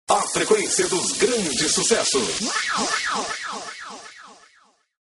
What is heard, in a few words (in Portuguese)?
vinheta